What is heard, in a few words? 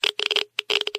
geiger med